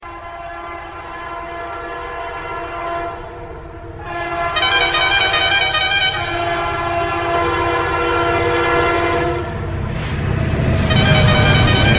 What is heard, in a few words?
train horn 3d; games; ringtones